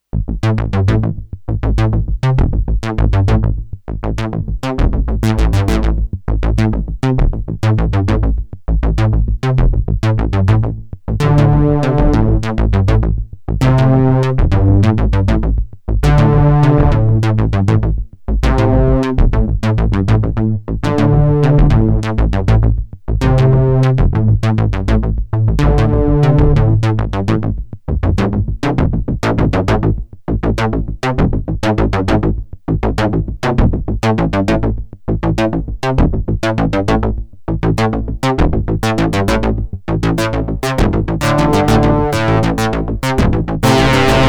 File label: Crossmod; system8